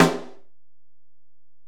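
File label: Acoustic Snare, Alesis DM-PRO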